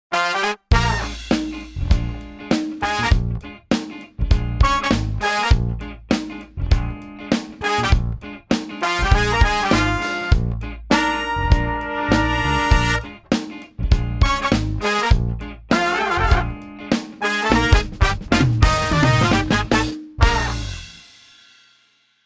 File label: Slow Session Horns; Native Instruments